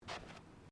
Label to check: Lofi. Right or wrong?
Wrong